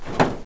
Roof step; Demo Man

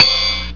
cymbal; Gold Digger 1.2.1; Arcade

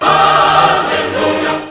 Bonus Spell Sound; Chaos

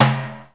boom

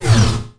SHIELD DOWN; Messiah

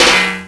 clang

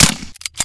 silenced fire, weapons; Spearhead Demo; MacFormat 2003 June

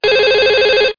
phn rng

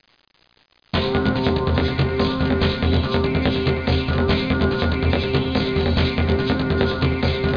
Shield Dance

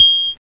beep fx; Star Warped (1997)(Palladium)

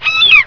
The Seagull Sound; Speed Chopper

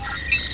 Connect

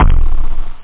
SR-16 Bass Drum; Amiga-CD 1996 #5-6; sampler; drums

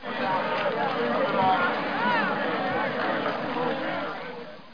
market; CAESAR3

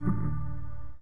nav up; Music Toys

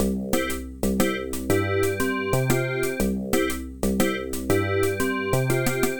waltz, pcorgan; medsonic

